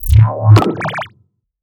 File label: symbol upgrade; netent